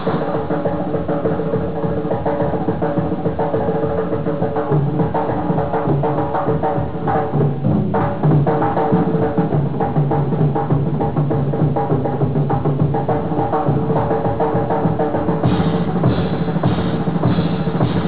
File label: drum solo